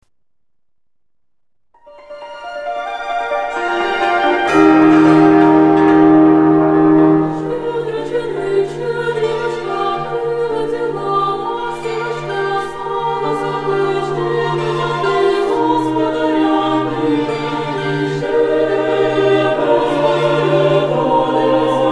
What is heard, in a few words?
choir ukrainian bell carol; kyiv